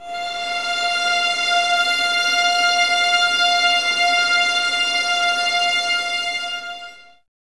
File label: VS F 6, String Ensembles, 11 Violins, Sul Ponte